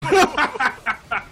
laugh

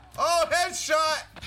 headshot

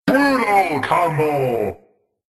brutal combo; killer instinct

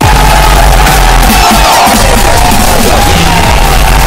ohhhhhhhhhhhhhhhhhh

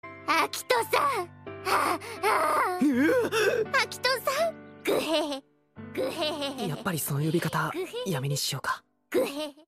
guhehe

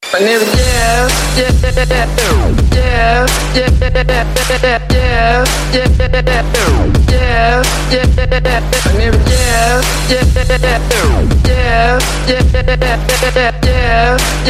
trap remix

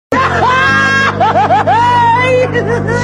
haha

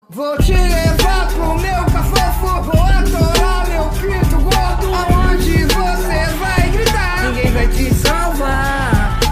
melody

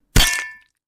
hitting

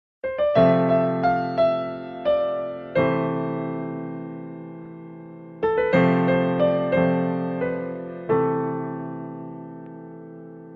sad melody; scrubs

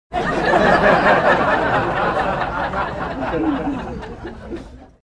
audience laughing

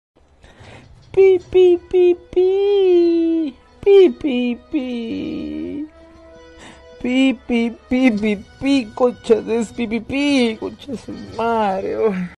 pipipi

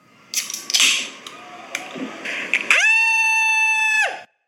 cold one crack with yell

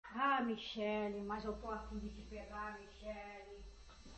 aaaa

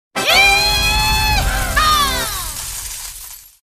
tip sound huge

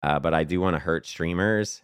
hurt; streamers

